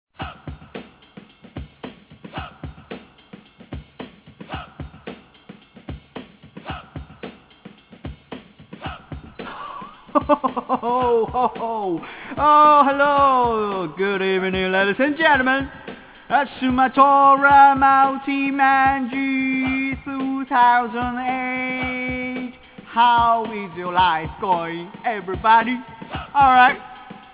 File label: SoundScape